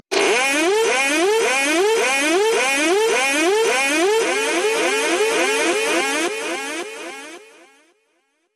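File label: Alarm Fading Buzzer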